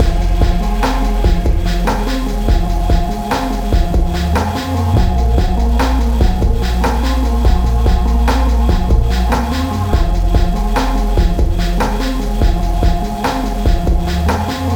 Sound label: Lofi Beats Loop; Anger Foot